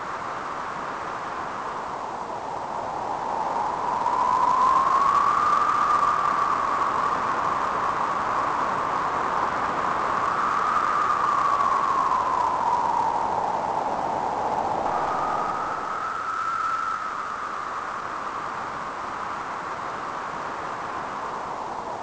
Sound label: wind sea; Pirates Of The Caribbean